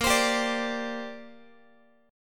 Bb 6th Add 9th Bb Guitar; Bb6add9 strummed; x,18,15,17,18,x

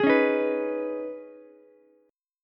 C sharp 7th Suspended 2nd, C sharp, down, Ukulele; strummed; chord

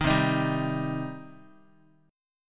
C Minor C down Guitar; strummed; JGuitar

